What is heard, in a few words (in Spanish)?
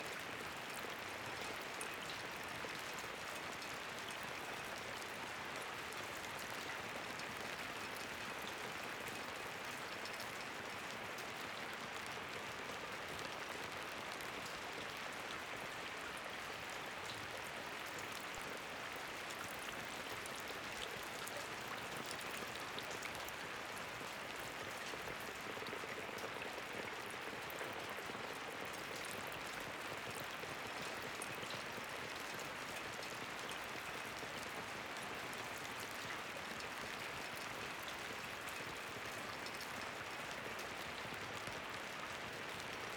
Thunderstorms, Lluvia